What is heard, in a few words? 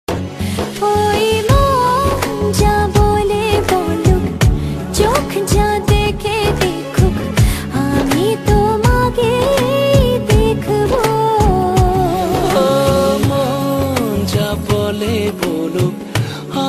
Bengali Ringtone